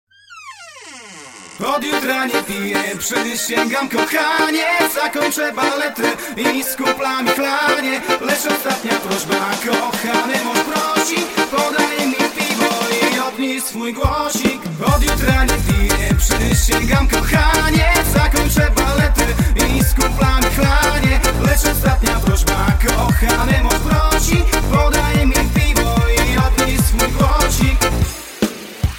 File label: Ringtone